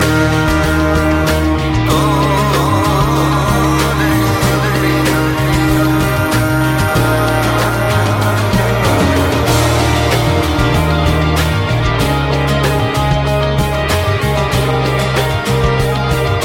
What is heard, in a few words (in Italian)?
Classica